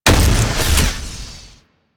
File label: Flak Cannon Alt Fire; Warframe; UT Flak Cannon